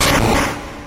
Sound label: destroy; P3D-Legacy; 2.5DHero